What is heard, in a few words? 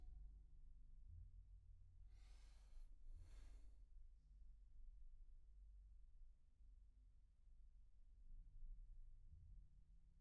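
Overhead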